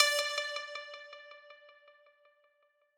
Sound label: pluck